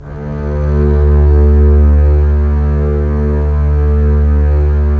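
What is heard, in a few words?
STR CBS, Strings; Roland - LA Composer vol. 4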